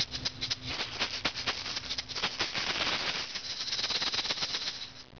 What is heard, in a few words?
meinl ufo loop shakers